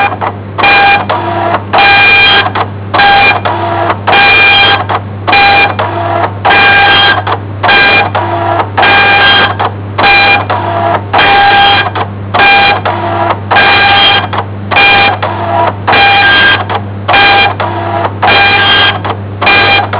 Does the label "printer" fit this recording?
Yes